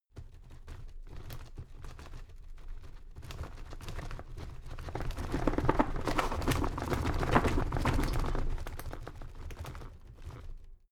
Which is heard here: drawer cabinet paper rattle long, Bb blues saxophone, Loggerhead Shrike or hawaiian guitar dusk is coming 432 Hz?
drawer cabinet paper rattle long